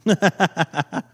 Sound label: short laugh